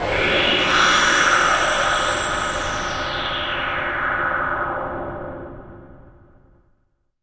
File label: NOIS; XXL 800 Production Effects